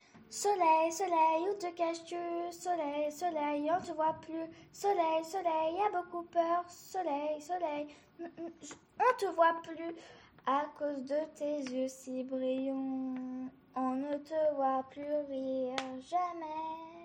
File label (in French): chanson soleil